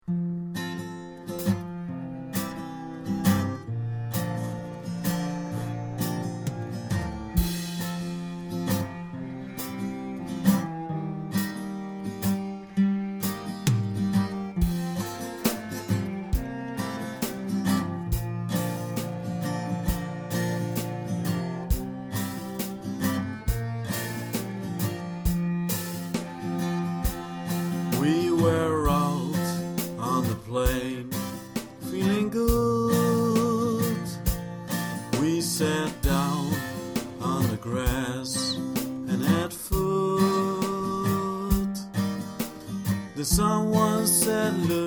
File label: Drums